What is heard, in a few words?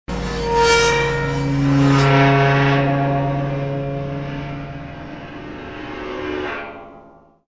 metallic scream